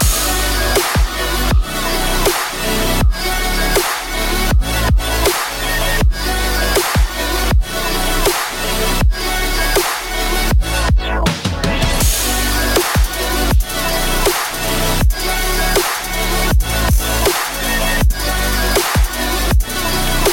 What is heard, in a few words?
CarDealer BuyCarMusic Loop; gear club